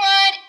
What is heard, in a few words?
vocal